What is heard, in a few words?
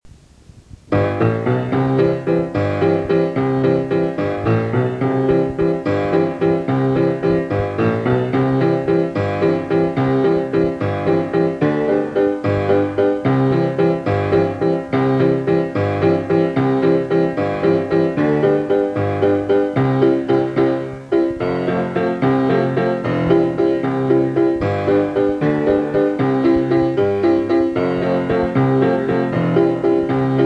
piano; Tirolese I